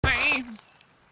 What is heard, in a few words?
aah ee